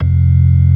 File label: ORG.3 F1; B3 FULL